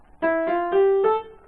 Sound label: piano; Exercise 8.1.1